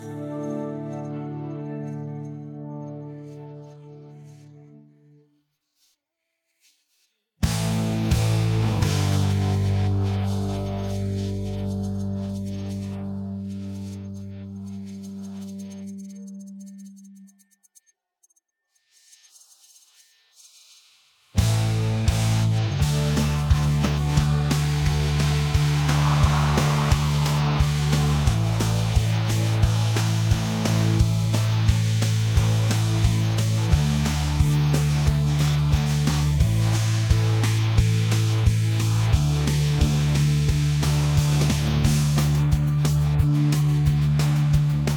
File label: Jovem Dinâmico (Instrumental)